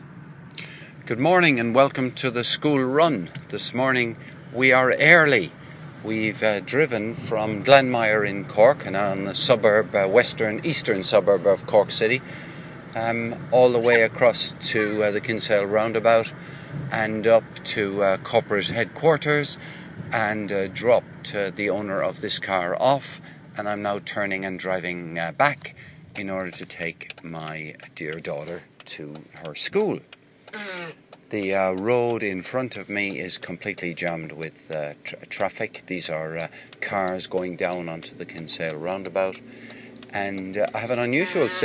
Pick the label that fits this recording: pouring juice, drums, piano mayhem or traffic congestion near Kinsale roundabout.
traffic congestion near Kinsale roundabout